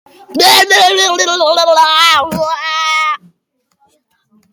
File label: screaming lol funny